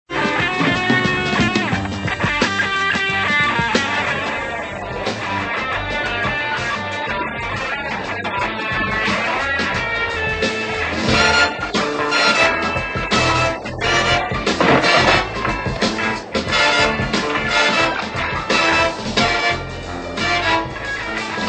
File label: Generic Rock Disco Theme